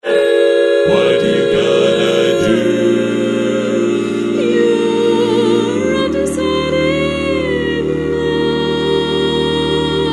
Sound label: All Parts, barbershop